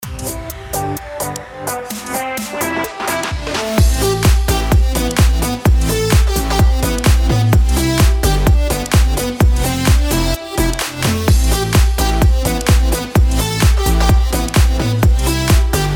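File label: future bounce, A minor, 128 bpm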